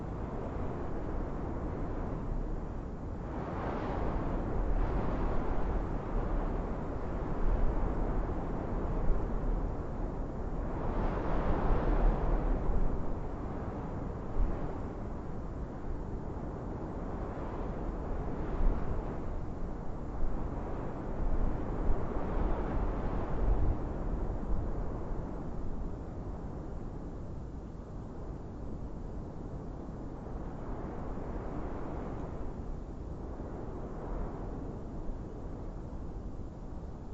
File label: wind; Golden Crayon Sounds